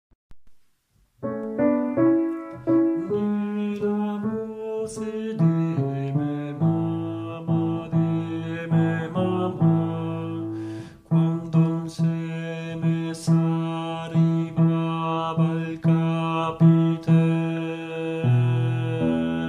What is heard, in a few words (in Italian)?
baritoni